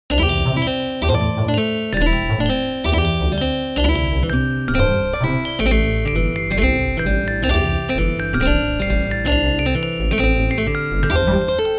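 polyrhythm with seed pos increment and rotations inversions etc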